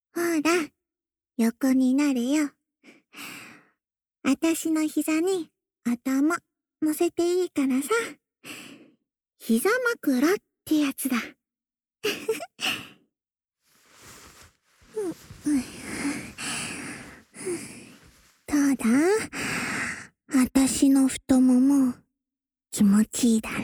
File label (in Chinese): 舔耳、言语责罚 右; el04 02 『由卡忒莉娜带来的舔耳、言语责罚（右）』